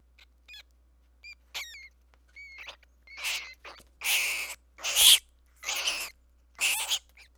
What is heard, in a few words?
Long Shriek Sequence, rat